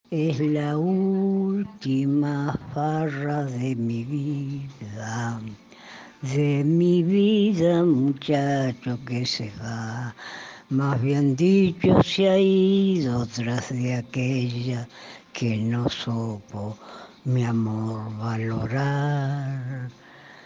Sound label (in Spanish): audio tango